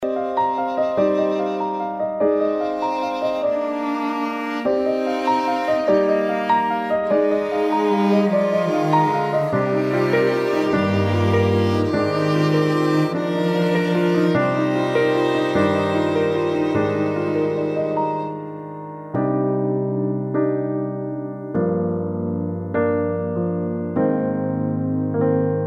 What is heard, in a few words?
instrumental; karaoke; Krakowskie Centrum Artystyczne